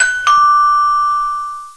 buzz; MOBICLIC41

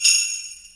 jingles; cubic

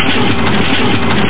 b17 starting, B-17G Flying Fortress; Air Warrior Demo

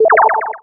Open Hi-Tech

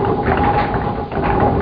gear

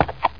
clop; Hexen II

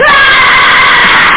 hwaaaah special; ericschwartz; qualitytime

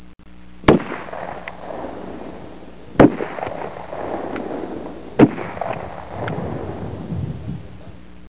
RIFLE

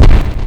Hit; skrap

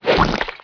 Vampire Acid Bolt; Dark Eden Beta Test

